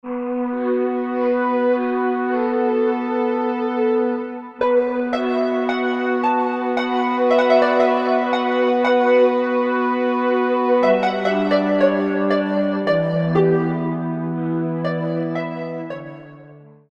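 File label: Symphonic Pizzicato, Korg MSC-1S; Korg MSC-1S Variety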